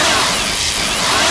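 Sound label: force lightning loop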